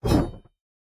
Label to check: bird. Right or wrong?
Wrong